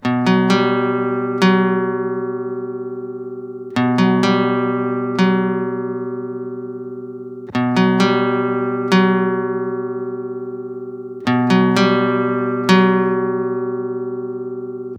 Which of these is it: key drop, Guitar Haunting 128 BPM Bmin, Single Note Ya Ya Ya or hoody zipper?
Guitar Haunting 128 BPM Bmin